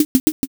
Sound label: horse hoof